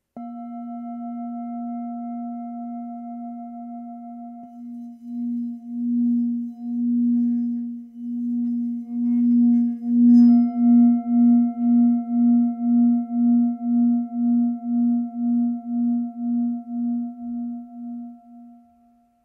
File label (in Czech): tibetska misa